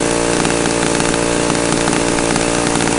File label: chainsaw loop; sawrunner; zombie riot